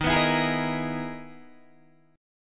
E-Major Sharp 11th Eb

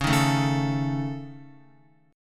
Db Suspended 2nd Sharp 5th Db Guitar; strummed; Dbsus2#5; JGuitar

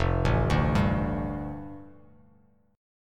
F 6th Add 9th, Bass Standard, F6add9 chord; arpeggiated; 1,0,0,0; JGuitar

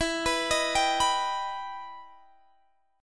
E Minor Major 7th E down Guitar Drop D

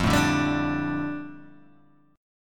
F 6th Add 9th Guitar Open G, chord

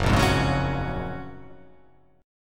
F sharp Major 9th

